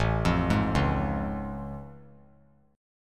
A Augmented Major 7th A; arpeggiated; 9,12,11,11; JGuitar

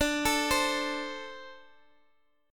D 5th 7th D; D57 Chord {X X 12 14 13 X} arpeggiated

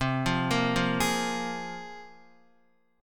C 7th Suspended 4th Sharp 5th, Guitar; C7sus4#5 arpeggiated

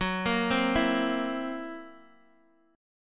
Gb 7th Flat 5th Gb down Guitar Open G

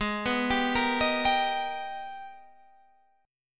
G sharp Minor Major 9th, Guitar, Standard; arpeggiated; JGuitar

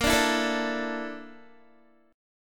Bb Major 7th Flat 5th, Guitar; 17,17,16,14,14,x; 4F,4A,5C,5G,5C,6E; strummed

